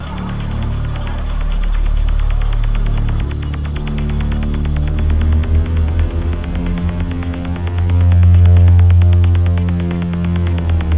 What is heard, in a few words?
Tribal War; fortune